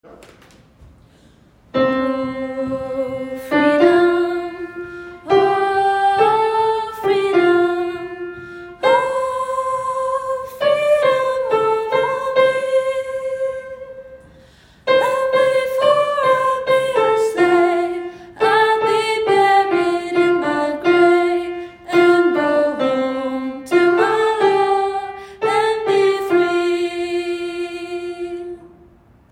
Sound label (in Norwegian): sopran, gospel; Oh, freedom; kongsberg; kor; gospel for alle